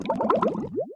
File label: win Aquarium Restore Down